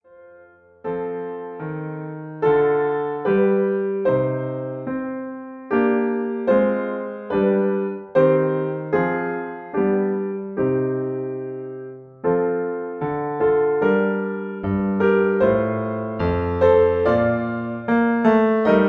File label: Accompaniment G